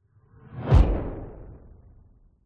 sound design cinematic whoosh growl fast impact dark; zapsplat